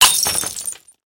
glass breaking; soundscrate

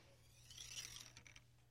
archery foley arrow pullback

